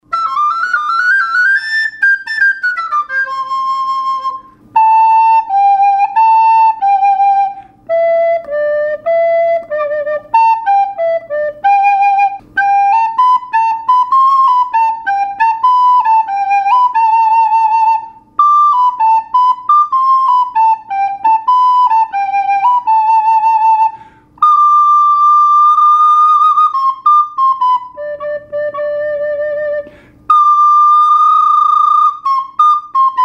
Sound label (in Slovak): zobcova flauta